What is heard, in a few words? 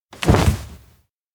surprise face; anemoi